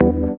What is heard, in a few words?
DR. LOOP; X-Static Goldmine 3; CHORDS